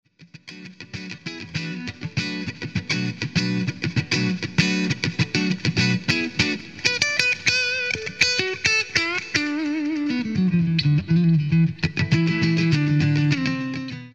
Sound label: Rhythm Neck